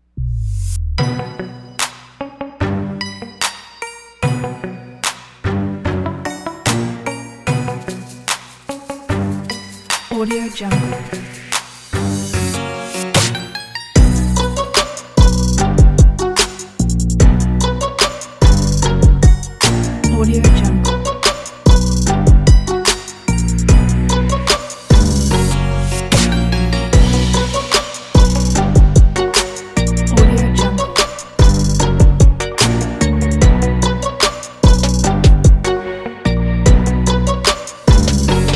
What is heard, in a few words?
hip hop dramedy